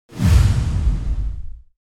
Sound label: Spacecraft futuristic vehicle approach fast